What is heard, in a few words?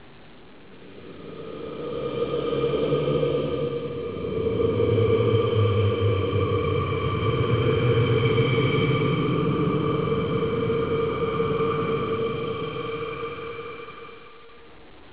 spook